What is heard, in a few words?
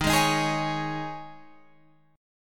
D sharp 7th Suspended 4th, Guitar, Open F; D#7sus4; strummed